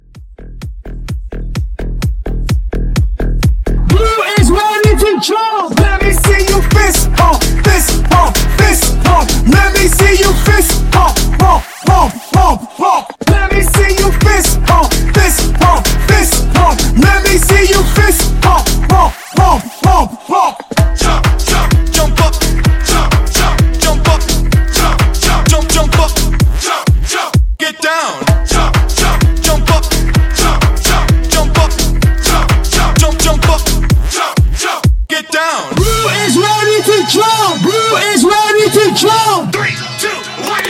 Hype Edit 128

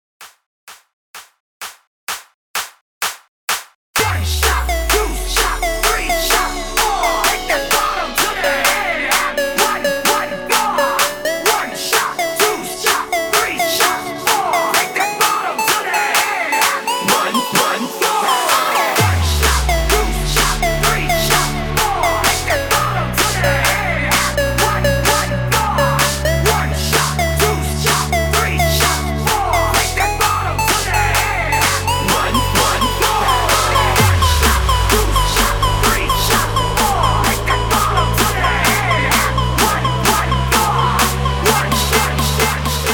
PARTY STARTER, 128 BPM

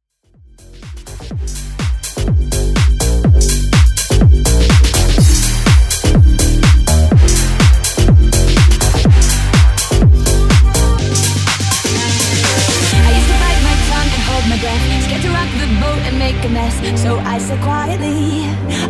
Mash Up

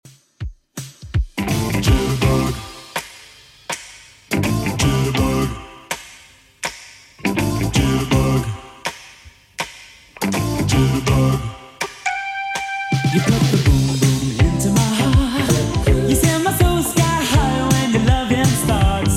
80s Redrum 163bpm

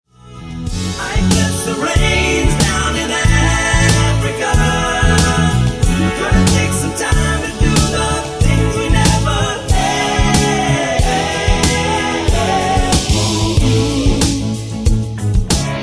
Funk Redrum 93 Bpm

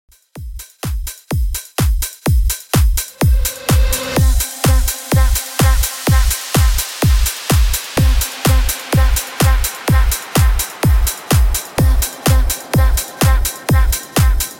In Outro Club Edit 126Bpm Clean

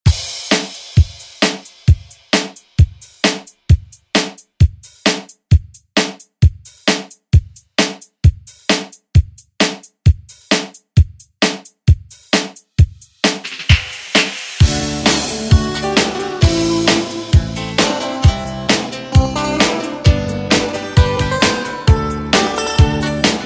80s Re Drum

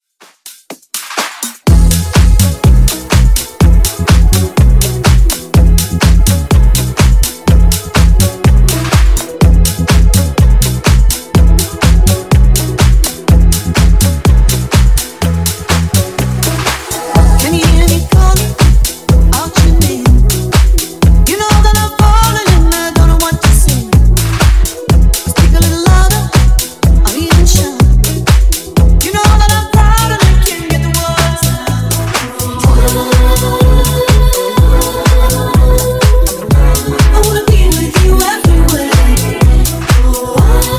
funk, 124 bpm

